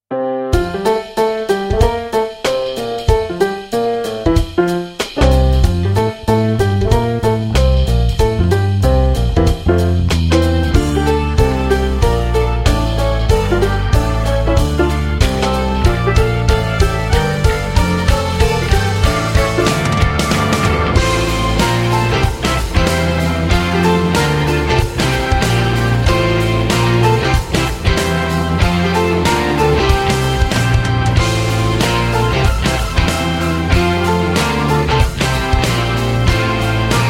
Choral; BWV 645

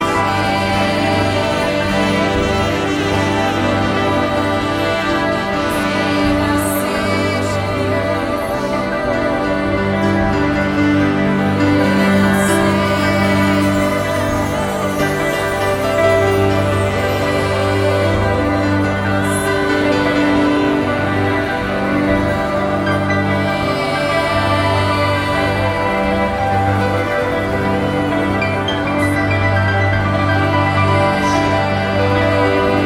Glocken

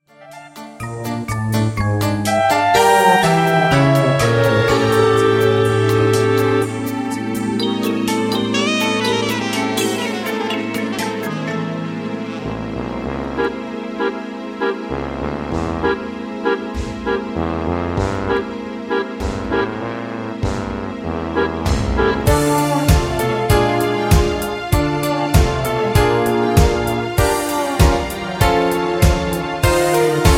Tiermedley-Playback

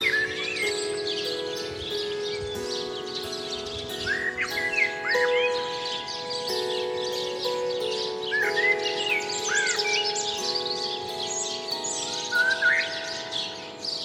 Vogelgezwitscher

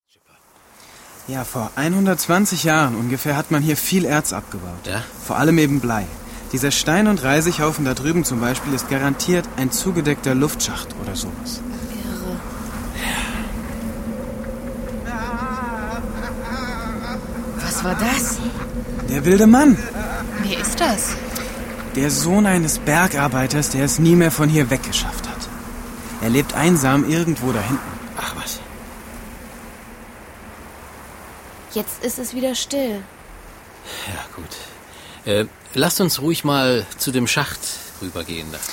Im gespenstischen Hochland

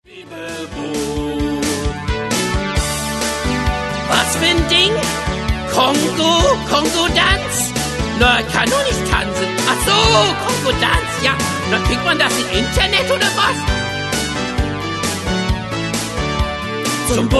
Rap

